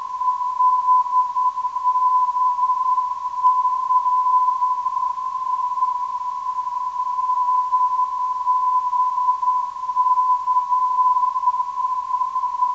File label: 342.08kHz, ndb; HBC